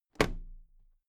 toolbox close drop lid regular

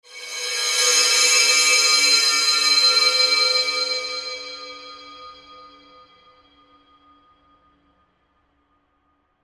hat cymb

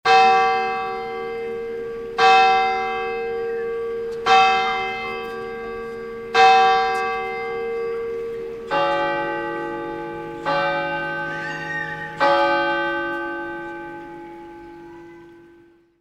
church bell church goers

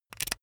insert usb cable fast

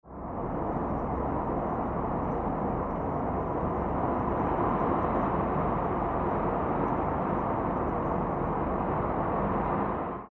wind howling steady atmo

